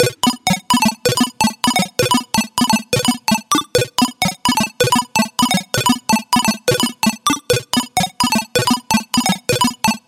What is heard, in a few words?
Pluck; Digital Assets; Routed Sound; Serum 2 Expansion - Hyper Gamer Vol.1